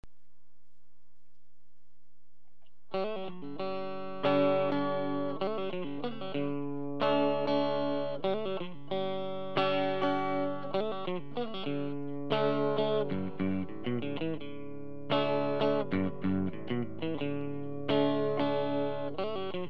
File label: Tweed Clean; dialled back the Volume and the Gain to 7 and 5, respectively